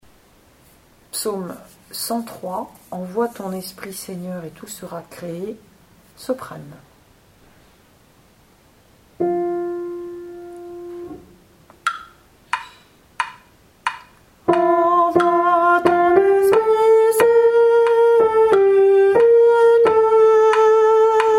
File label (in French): Psaume 103 Envoie ton Esprit Seigneur et tout sera créé SOPRANE; Gouzes